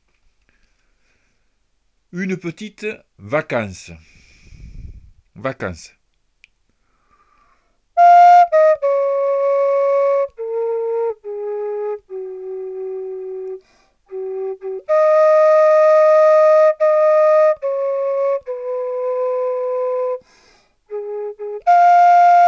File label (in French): vacance flute